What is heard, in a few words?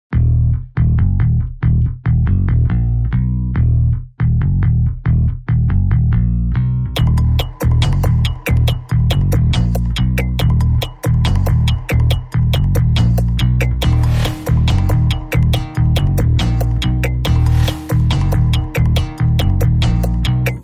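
background music Telugu Ringtone; RED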